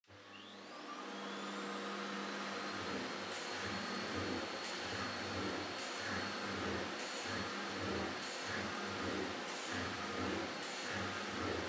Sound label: Vacuum Cleaner Far Vacuum Floor Wood High